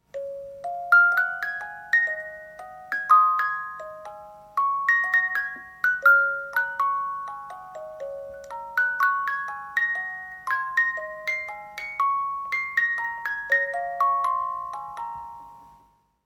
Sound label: boites musique